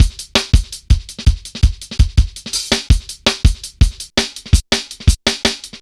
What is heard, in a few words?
165, drum loops, drum & bass; Total Drum&Bass